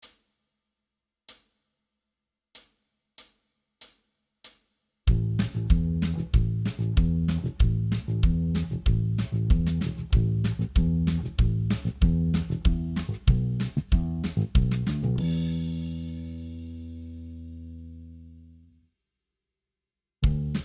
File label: engine drums bass